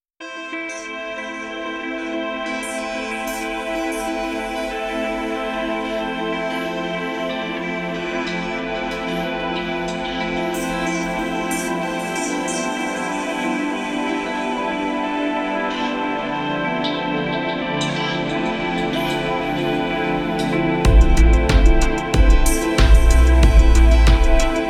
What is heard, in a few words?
Ambient